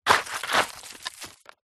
industrial fossicking trowel plastic dig disturb dirt